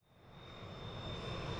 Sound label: airplane fly over; havapima